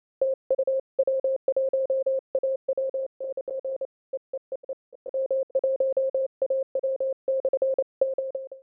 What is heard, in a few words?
cw 7 mhz; hprModel sines